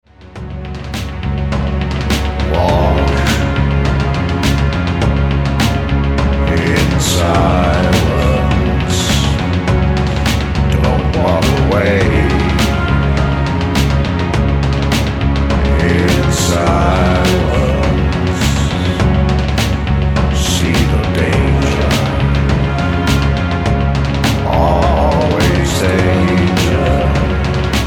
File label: Atmosphere